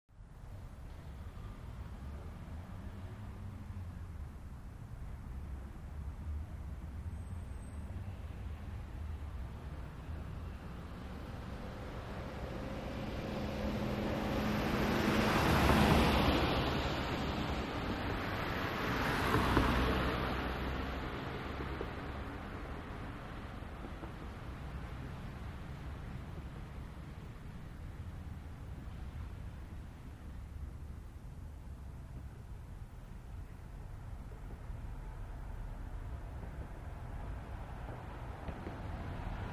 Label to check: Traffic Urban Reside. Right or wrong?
Right